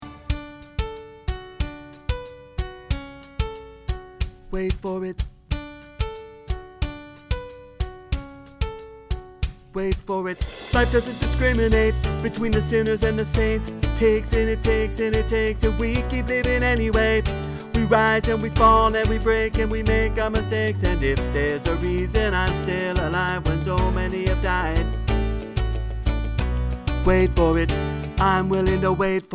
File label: bass